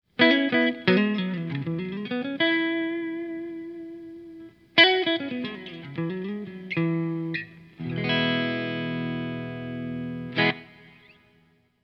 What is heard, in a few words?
delta middle, strats; pickups